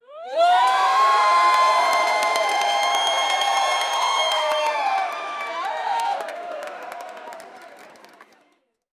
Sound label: explosive crowd roar; peak